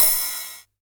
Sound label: 909 ride tape; Goldbaby Tape808 & Tape909; The Tape 909